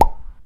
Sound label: Plop